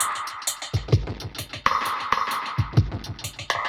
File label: Drum Kit Echo Kit 130, dub drums samples, 130bpm; musicradar